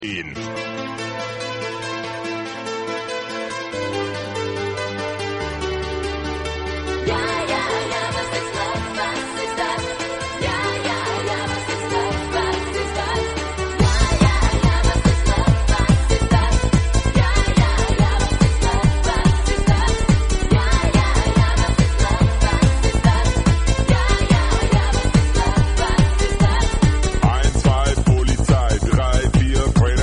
US remix

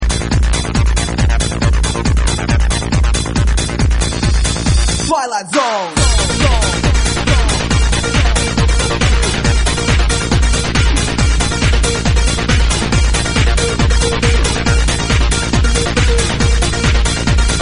hard trance remix

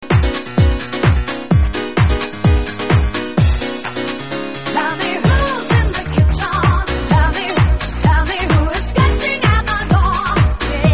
Dancehall Style

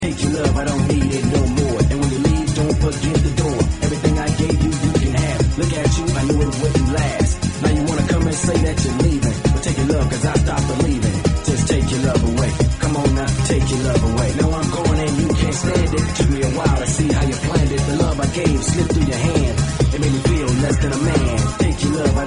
noise mix

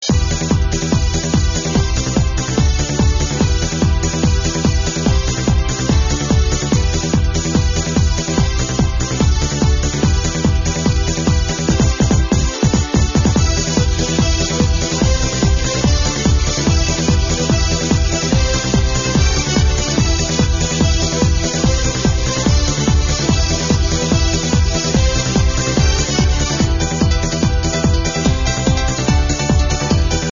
instrumental

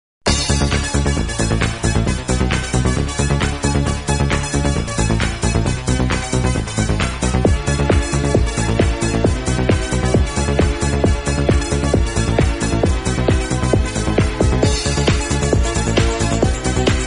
instrumental

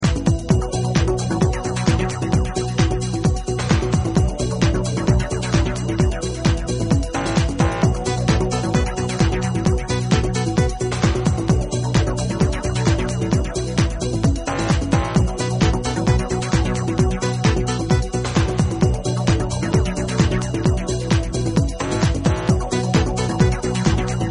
house trance mix

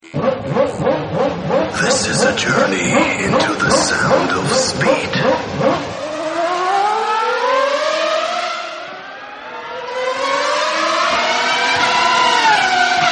instrumental mix

